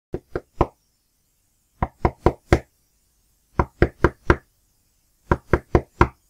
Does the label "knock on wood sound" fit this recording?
Yes